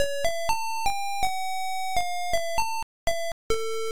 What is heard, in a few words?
Gameover